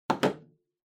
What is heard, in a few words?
Handheld Transceiver, Put